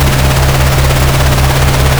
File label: car engine accel loop; vehicles